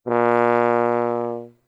Tuba ff Bb2; Brass

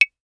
clave6 ff stereo, Claves, Hand Percussion

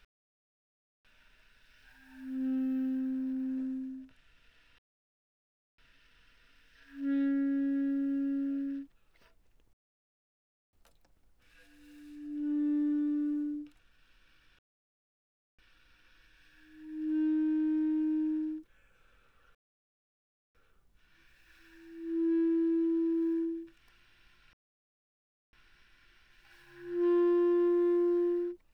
Bass Clarinet pp C4 B4, woodwinds

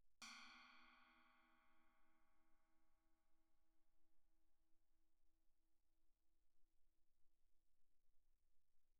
chinese stick normal pp, suspended cymbals; Percussion

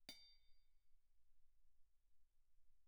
hihat, bell, pp; Percussion; cymbals

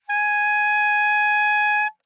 Bass Clarinet ff Ab5 stereo; Woodwinds

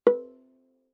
Viola pizz ff sul G B4; MIS Pitches - 2014; Strings

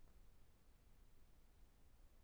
ambient silence; Bb clarinet; woodwinds

